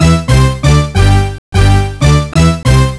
Chime

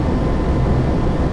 tank; starsiege

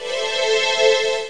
key; Museum of Anything Goes

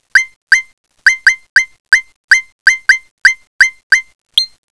hit

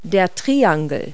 triangle; Multi-Lingual Talking Picture Dictionary

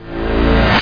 TRANSPORT IN SOUND; Escape!

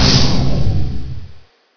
bee sting; Machines

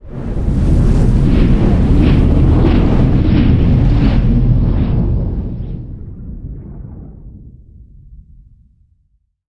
Terran Large Close

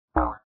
Release Ball; smashing; Puzzle

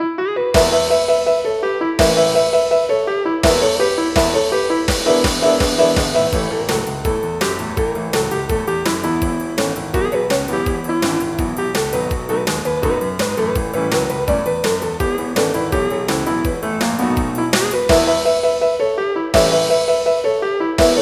rock'n roll

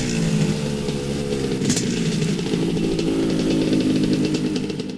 decel; PC Gamer 4.5

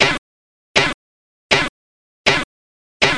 Chaff Flair; A-10CUBA

